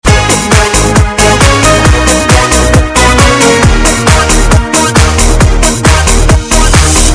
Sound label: Loop